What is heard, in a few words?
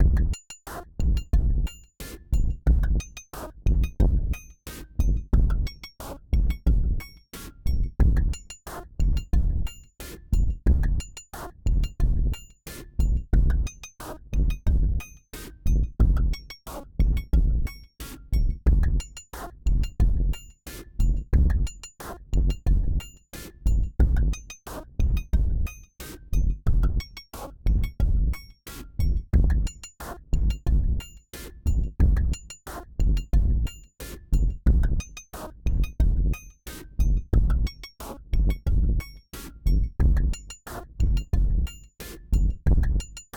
bass